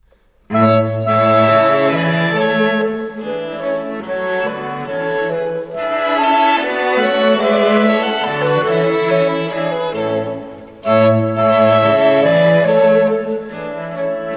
Quintet For Clarinet, Two Violins, Viola and Violoncello in A Major